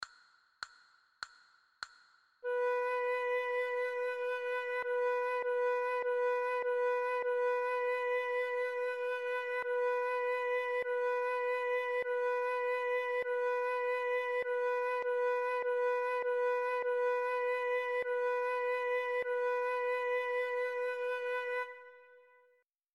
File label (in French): Si b, flute a bec